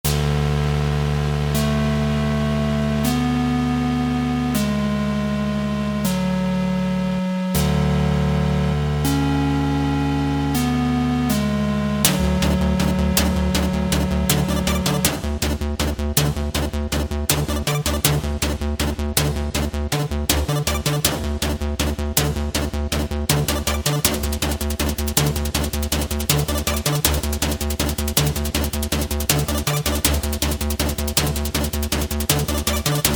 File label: Suit Up 8 bit